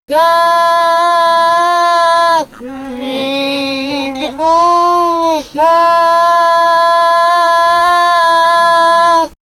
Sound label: a chimpanzee singing coun